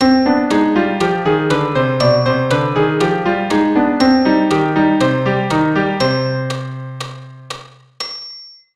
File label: Cm harmonic, keyboard